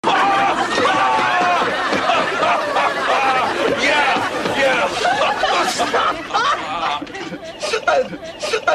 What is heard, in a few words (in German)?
Lachen; alf